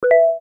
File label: hit, keno; games; Bingo